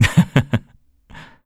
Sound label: laugh; Eternal Return; KOR